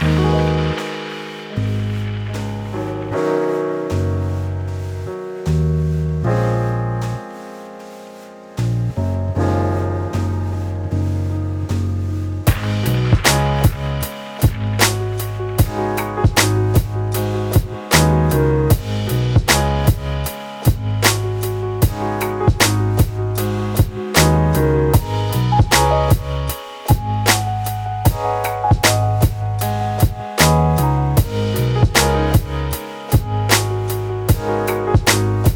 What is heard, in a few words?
154Bpm